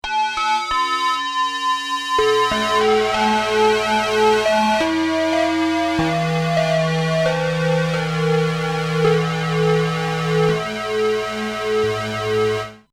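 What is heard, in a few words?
xmod glockomat, synth; jx8p; moogulator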